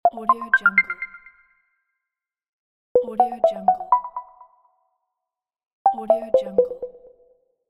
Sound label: message notifications